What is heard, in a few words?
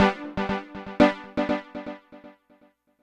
Echo Dance; Dr. Fink's Funk Factory